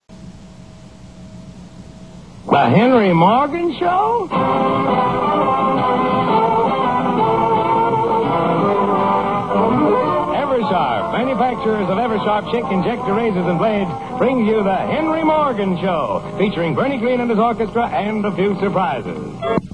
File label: Theme; Eversharp